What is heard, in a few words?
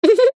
cartoon character cute high pitched giggle laugh short